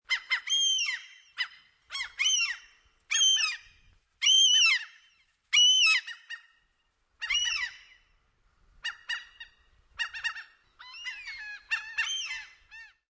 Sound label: Seagulls Various Gull Calls With Slight Echo, Few seagulls calling, no ocean waves; Birds; Lynne Music